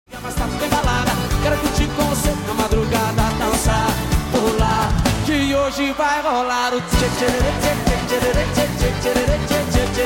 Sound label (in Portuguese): balada